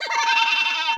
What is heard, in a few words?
Wizard Mini Laugh